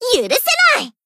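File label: attack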